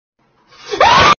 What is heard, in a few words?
black guy screaming